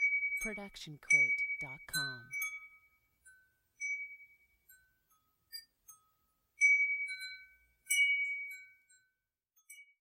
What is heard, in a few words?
Wind Chimes